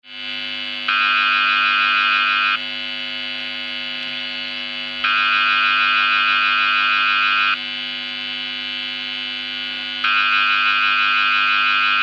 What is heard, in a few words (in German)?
Gitarrenbrummen